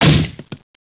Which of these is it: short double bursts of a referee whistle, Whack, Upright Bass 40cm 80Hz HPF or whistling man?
Whack